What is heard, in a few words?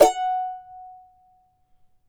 charango F#4; Spectrasonics; Hans Zimmer Guitars VOL-2